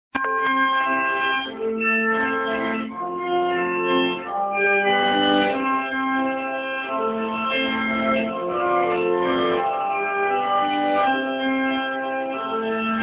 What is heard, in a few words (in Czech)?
varhany; kroniky 2007